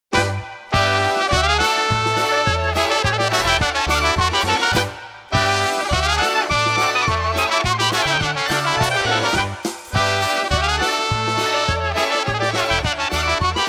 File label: Polka